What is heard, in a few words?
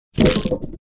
Sfx Enemy Skeleton Dead